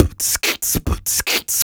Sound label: Human Beat Box 140 Pop; Loopmasters